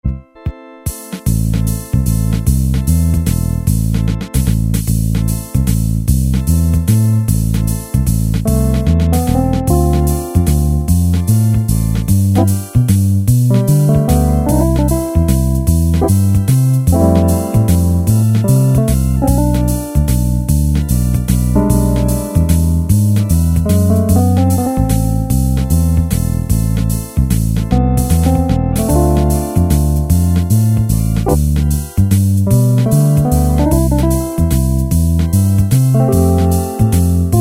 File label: jazz waltz, Automatic Rhythms